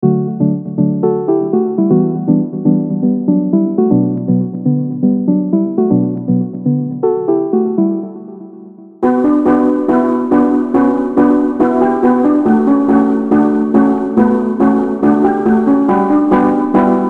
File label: Aeolian Natural Minor Melodies